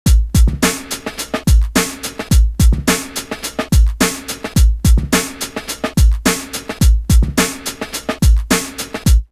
Beat; AMG

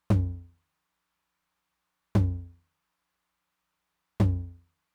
Low Tom, Roland TR-909; drum machine; Roland TR-909 Ableton Project

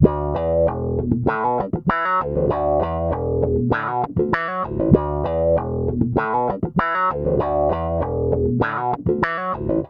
Bass Guitar Loops; FM 57 - The Ultimate Sample Collection (1997)

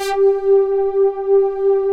SYNTH SYMPH; Pro-Rec - Synthsonic; Z1 PADS

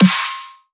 pan snare

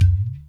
Chatan Hit, Percussion; Ambient Grooves

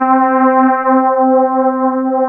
CMI ORGAN; Wizoo - Powered DX

